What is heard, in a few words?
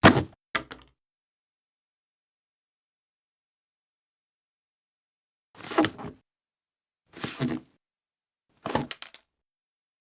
breakdown